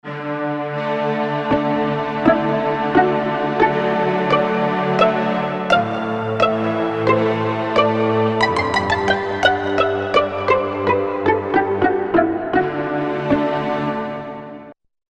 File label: Str Pizz, Korg MSC-1S; Korg MSC-1S Variety